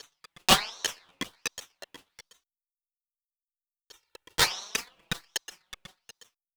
Drum Fx Loop, Electronica

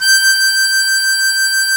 HARMONICA

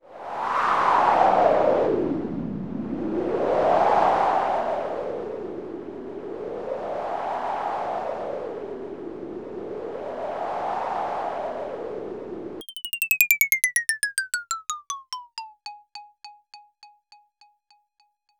Roland BK-5 FX

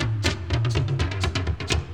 Dance Grooves

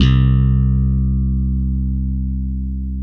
SLAP BASS, Electric Basses; PlatinumPhattCD2; E-MU Producer Series Vol. 8 – Platinum Phatt

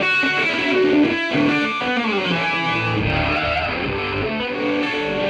Electric Guitar

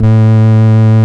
VOX CONTINTL; Big Fish Audio - First Call Vintage Keyboards

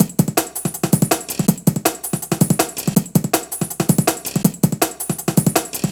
Loops, breaks; M8

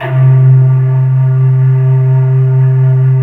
brass, synthesizers; VFX-SD

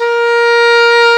English horn A#3; InVision Interactive; Lightware VOL-1; Instruments & Percussions

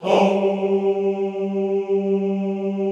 HOUUU, Classical Choir

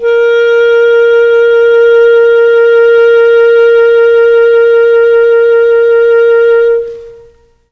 CLARINET A#3, Mellotron; Mike Pinder - Mellotron Archive CD; CHM CLARINET

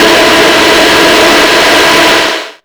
TRASH WIND, Hard & Loud Techno; USB Soundscan vol.01; AKAI

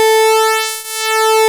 PULSE MOD

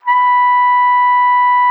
B5, TRUMPET; Xsample VOL-3

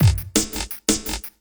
LOOP, Drum & Bass, 170 BPM; Ueberschall; Drum & Bass Resonance

